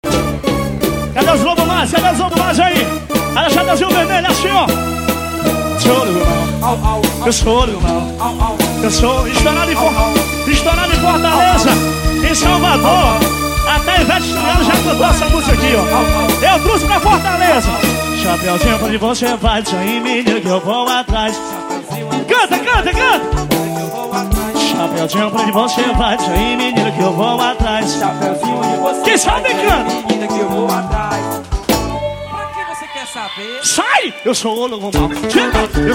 samba

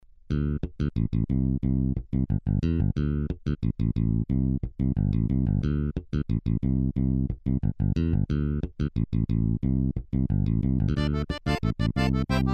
sampler de teclado ao vivo

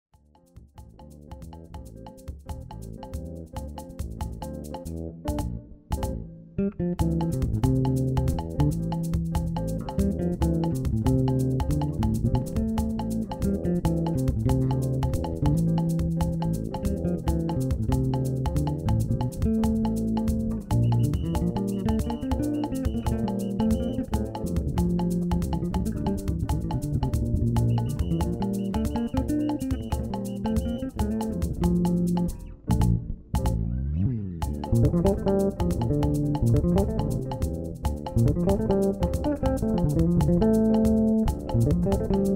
sambinha jam

